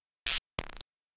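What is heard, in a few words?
PERCUSSION ELECTRO 140, Techno Drum; Techno2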